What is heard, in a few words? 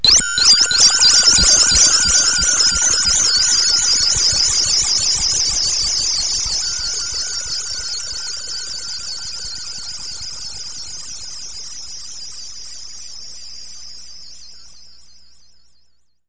radio, space; Select Soft Publishing Stock Clips Multimedia Clips